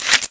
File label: Open, Electric Cosh